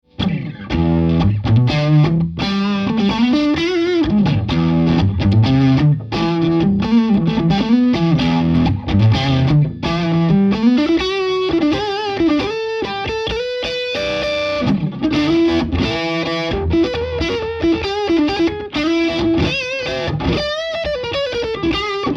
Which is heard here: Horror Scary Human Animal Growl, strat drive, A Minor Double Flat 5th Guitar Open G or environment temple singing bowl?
strat drive